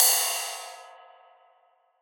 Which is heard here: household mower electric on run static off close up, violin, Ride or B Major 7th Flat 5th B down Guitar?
Ride